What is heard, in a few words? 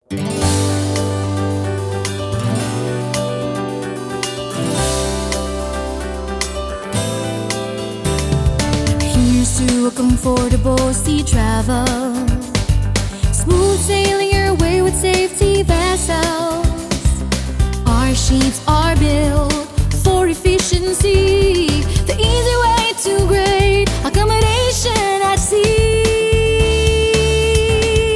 jingle